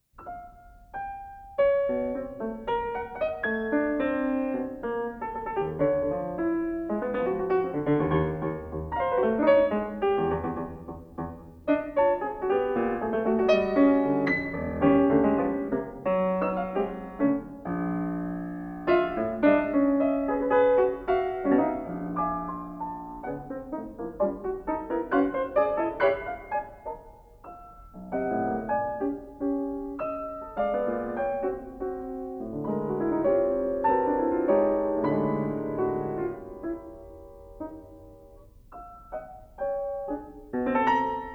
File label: Suite for piano; Schoenberg; Op25; Rosen